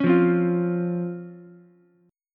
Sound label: C sharp Major 7th C sharp Ukulele; C#M7 strummed; JGuitar